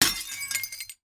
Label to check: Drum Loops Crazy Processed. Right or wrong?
Wrong